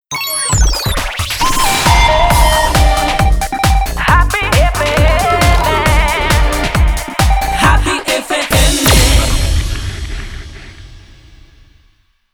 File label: happy